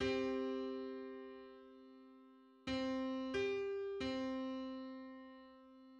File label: Three-hundred-eighty-seventh harmonic on C